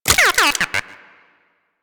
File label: Vomvalyst Lure Move; Warframe; Teralyst